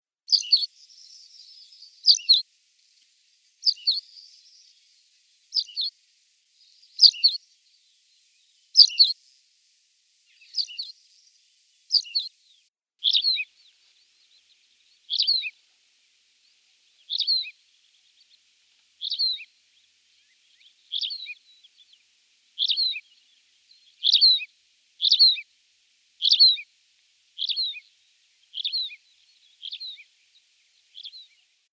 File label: Anthus campestris